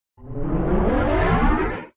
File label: gauge up